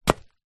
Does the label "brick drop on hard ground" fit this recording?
Yes